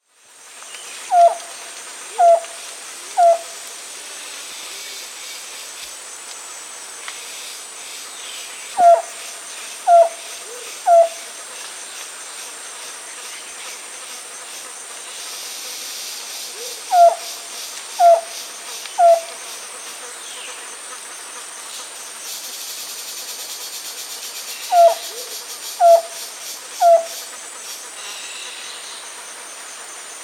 Amazonian Antpitta (Hylopezus berlepschi), Bolivia, Birds of the Amazon; S. America